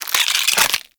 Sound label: Reload; Weapons; Infested Pistol; Embolist